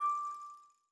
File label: Rose Hover; netent